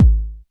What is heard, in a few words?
K909, KICKS TR 9+8